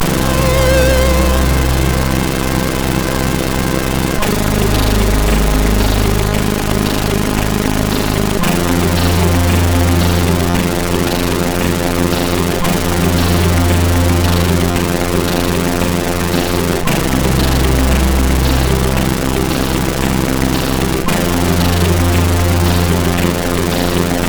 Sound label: E-Load loader, MOS6581R4; hvsc